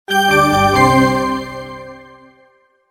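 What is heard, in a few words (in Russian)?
melodia vstupleniya, intro melody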